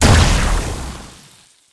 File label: poison hit; garrysmod